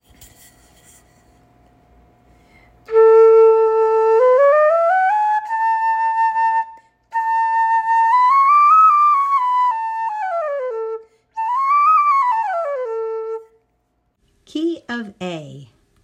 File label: Key of A, bamboo flutes